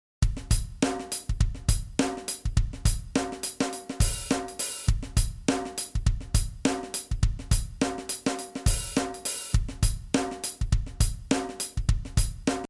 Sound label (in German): Loop mit FAT